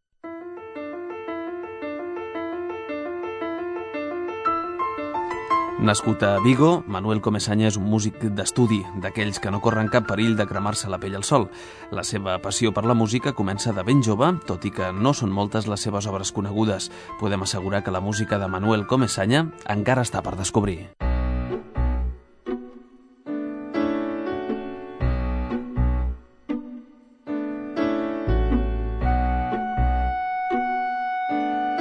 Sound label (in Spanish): aventura instrumental